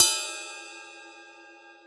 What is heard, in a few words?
Royality free ride sample tuned to the F# note. Loudest frequency: 8116Hz